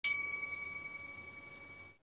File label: ding ding